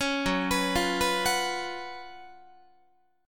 Gb Major 7th Suspended 4th Gb Guitar

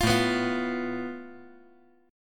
A Suspended 2nd Sharp 5th

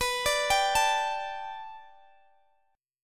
B Minor 7th Sharp 5th B Guitar DADGAD, chord

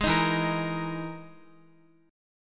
E-Major Sharp 11th E, Guitar; EM#11 strummed

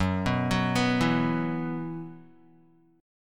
Gb 7th Suspended 4th Gb down Guitar, chord; Gb7sus4; arpeggiated; JGuitar

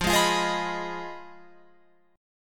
F Minor 7th Flat 5th, Guitar, Open F

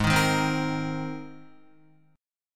Ab Major Add 9th Ab, Guitar; strummed; 3,6,3,5,3,6; down; 4F,4A,5C,5G,5C,6E

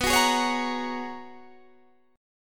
G sharp Minor 11th B, guitar chord

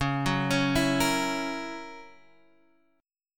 F sharp 7th, guitar

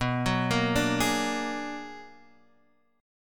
B-Minor Major 13th B down Guitar Half Step Down

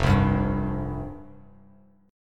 F Suspended 2nd F Bass Standard; Fsus2 strummed; JGuitar

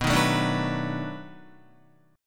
B 7th Sharp 9th B, Guitar; strummed

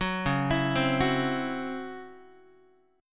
Db Minor Major 7th Double Flat 5th Db down Guitar; arpeggiated; DbmM7bb5; JGuitar

